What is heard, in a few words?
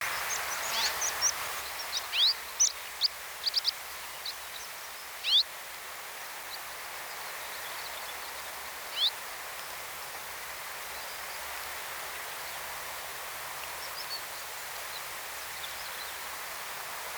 Common Rosefinch; پرنده توت خورک